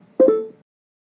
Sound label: notification